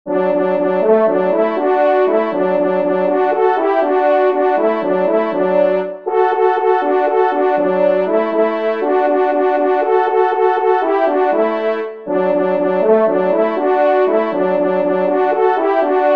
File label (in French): Rembuche Duo, trompes